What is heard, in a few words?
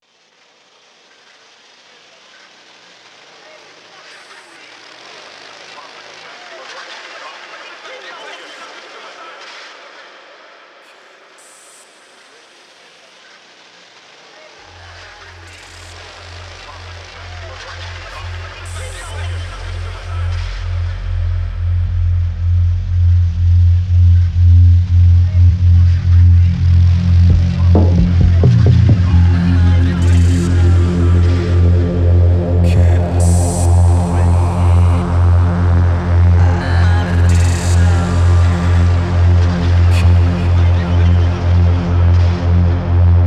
Dub